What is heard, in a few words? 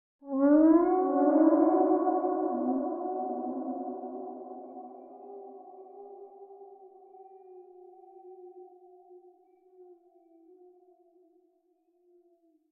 Wolf Howl Eerie With Delay; Hollywood Edge; sound designer's toolkit v2